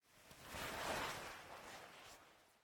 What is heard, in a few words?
Move Finish P C; MiSide